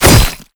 blade cut

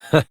heh

